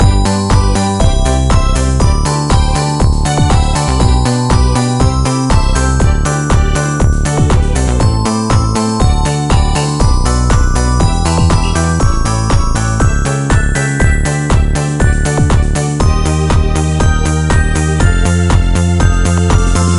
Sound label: BGM Summer; puzzling masterpiece exploration 2009 nds gamerip